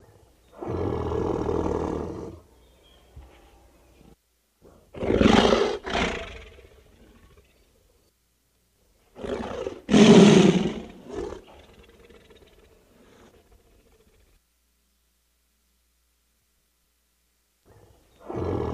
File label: Baby Dinosaur Angry F